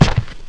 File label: foot big; Messiah